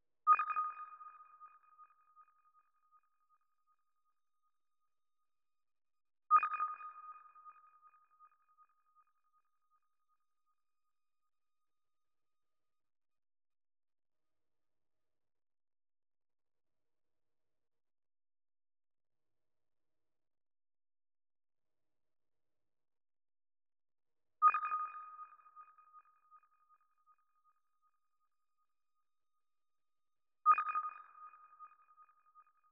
159 BPM D# Min Pluck, loop stems; Melody; Cymatics; 2022 Melody Collection Platinum Expansion; Sky Blue

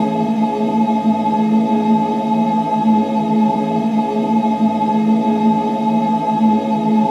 Texture Loop, Techno; Ghosthack; AC2024 - Day 1 - Techno Sounds 2025

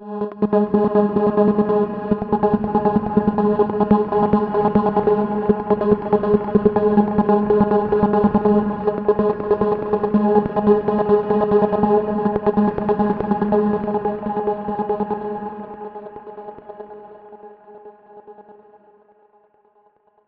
Atmosphere G# 142 BPM, Psytrance; Trickery

